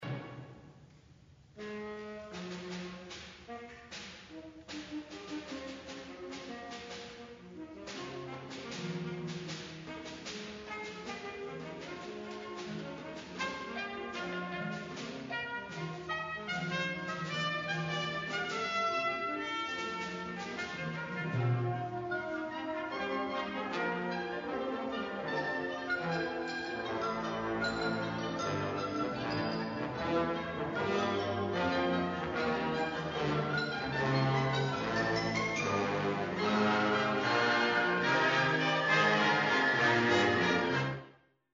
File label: Fanfare excerpt